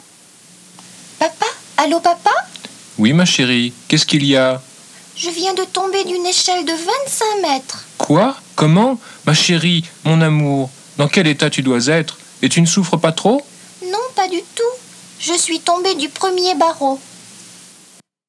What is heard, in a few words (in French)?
rire